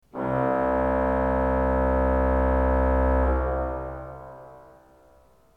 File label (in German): ton, orgel